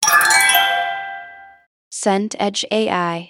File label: unlock achieve